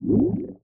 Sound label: Zombie